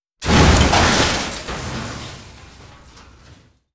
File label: grenade explo debris; weapons; Half Life Alyx